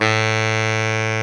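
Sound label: SAX Baritone Sax A 2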